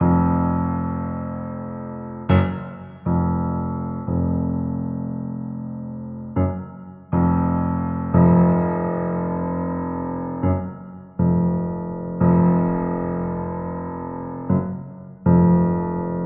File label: piano, 118 bpm